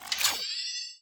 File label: mission start